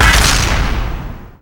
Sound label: gauss cannon; Halo 2 Gauss Warthog